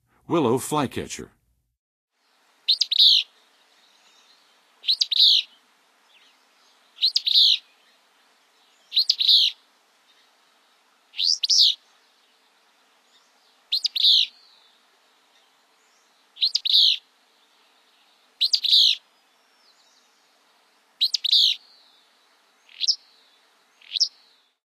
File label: Willow Flycatcher, Songs of Birds; North America; Western USA; Western USA Songbirds